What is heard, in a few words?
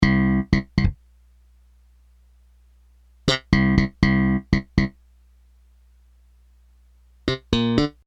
bass